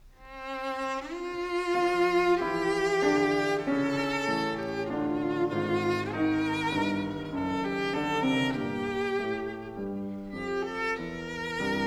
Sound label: in F Major, Cello